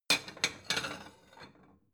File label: plate small shelve slide soft